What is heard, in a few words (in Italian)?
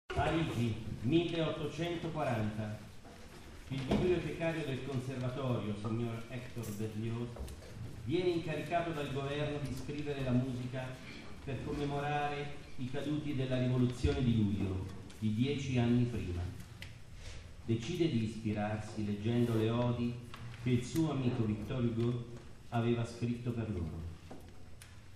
intro sinfonie funebre